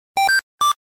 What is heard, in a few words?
Tone